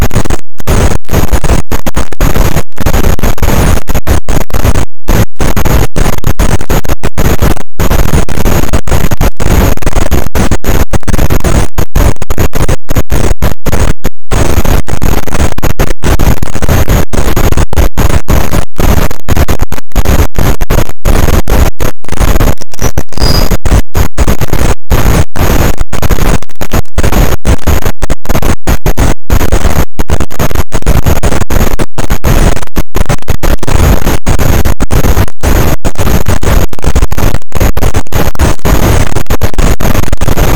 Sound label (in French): cachalot negatif, boat, Physeter Macrocephalus